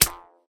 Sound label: snap